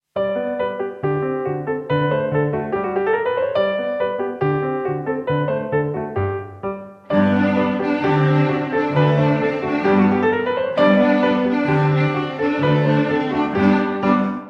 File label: cadences